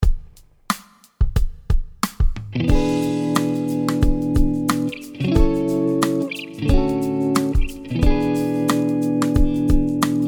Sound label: E Mixolydian Tonal Center; guitars; key centers vs tonal centers